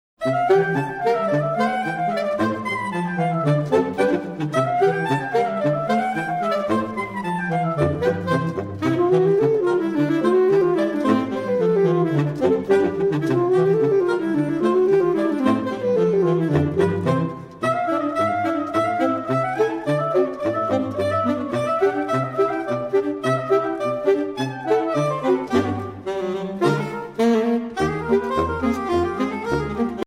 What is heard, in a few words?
Schlammspringer Mudskipper For Saxophone Quartet; Aquarium 2002 Arr. 2016